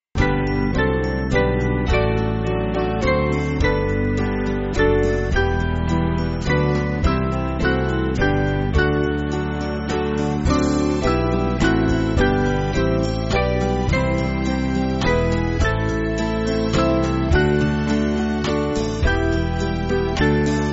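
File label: Band, Backing Files for Churches; With The Sweet Word; Verbum Pacis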